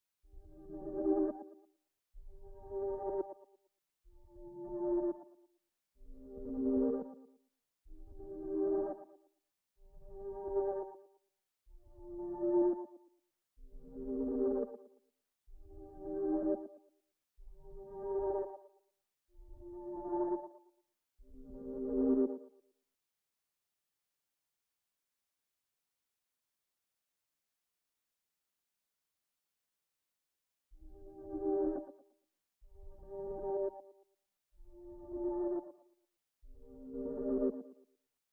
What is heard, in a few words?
126 BPM G Min Reverse Pad, Hip Hop; Melody Loops; Loop Stems; Cymatics; Cielo